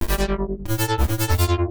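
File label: synth electro 140 A; Techno; Keys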